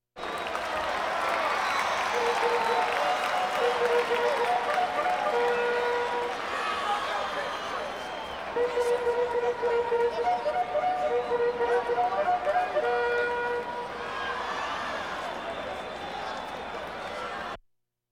Crowds Race cars Baseball

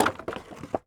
IMPACT Wood Plank On Wood Pile Slide; mono; IMPACTS; Universal Sound FX

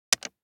DICE on Plastic, Drop, Standard, 3 Three Dice; Samples; ArticulatedSounds; DICE Sfx Party (Extended)

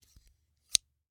Scissors, snap, snip, shear, large, single

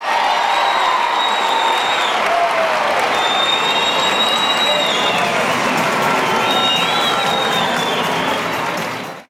Baseball Crowd Cheer; Crowds

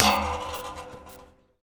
Street Ball Metal Pole Hit; SFX Kit

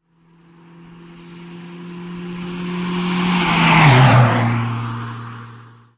car; Blind Drive

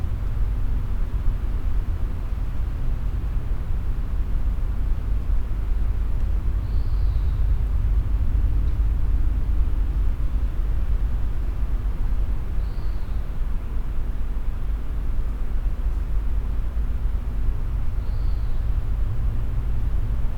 Urban din day traffic rumble bird; urban and suburban; boom library

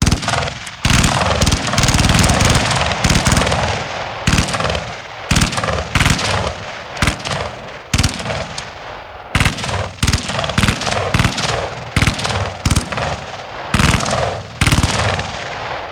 Machine Gun; Sound Ideas; Guns

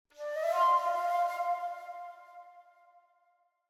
Flute Short Light Flute Melody; Hollywood Edge; sound designer's toolkit v2

